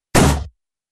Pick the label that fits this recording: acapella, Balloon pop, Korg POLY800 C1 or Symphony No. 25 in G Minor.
Balloon pop